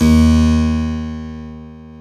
KEY HRPCR; Roland - LA Composer vol. 1

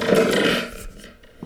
Thunder Drum

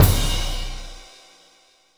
Ride set, Drum and Bass; Ride Set 1; Instrument Samples

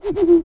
TUV BLOW, World Voices, TUVAN GROAN; Spectrasonics Vocal Planet CD5

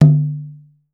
tumba, congas; Voice Crystal - Steve Reid Definitive Percussion

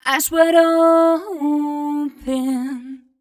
f mel d, fem Vocals

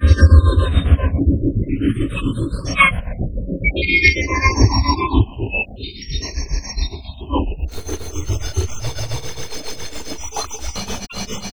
SILICAT SEQ, Disjointed Textures; USB Soundscan vol.33; AKAI; CHAOS

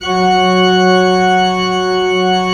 church organ f#2

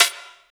SD, Drum & Bass, 170 BPM; Ueberschall; Drum & Bass Flash Trackz

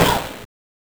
Snare, Genesis; Sonic 3; Launch Base; M8

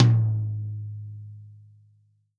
TOM, Real Drum Kit, POP KIT

